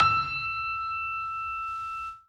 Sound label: piano melo